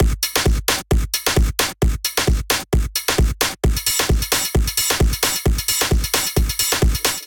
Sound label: Kit 132 bpm, drums; MIDI Clips; Pho Soup Kit; Ableton; Factory Packs; Skitter and Step